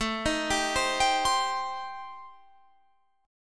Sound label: D sharp 6th Flat 5th, A